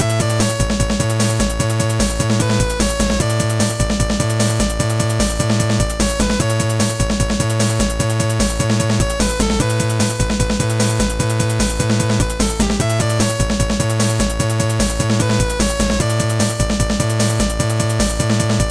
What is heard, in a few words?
synth beat 2; Dark Knight; Trackers; Modland